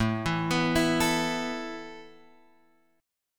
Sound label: A Suspended 2nd, down, Guitar, Open F; Asus2; arpeggiated; JGuitar